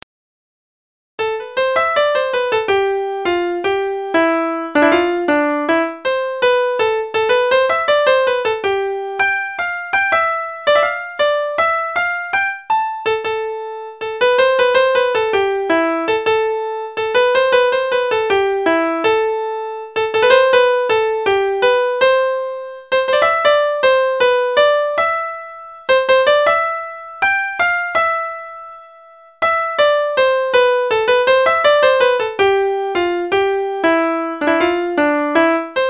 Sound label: Piano Solo